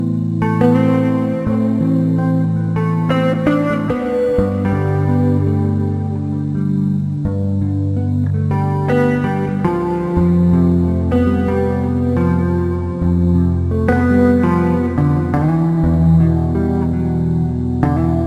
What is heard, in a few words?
Mellow Tune